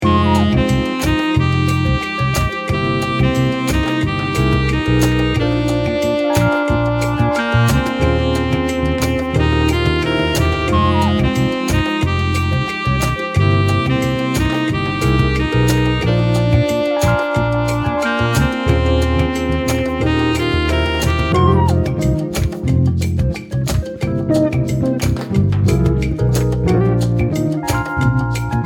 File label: elevator 90bpm